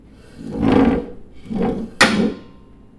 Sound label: Chair